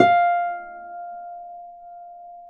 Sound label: F4 HARP; USB Soundscan vol.62; AKAI